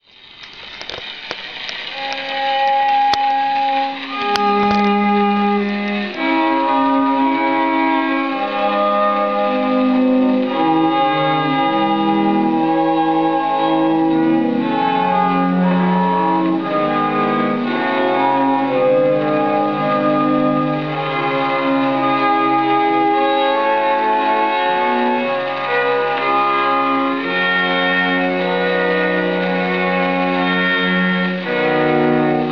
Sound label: Fantasy for a Chest of 6 Viols